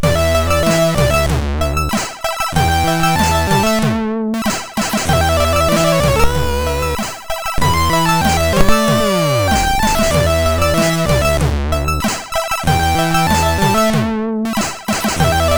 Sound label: Bassen, MOS6581R2